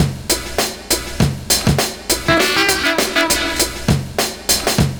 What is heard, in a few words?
SAX N GUITAR; Univers Sons - Kitch 70s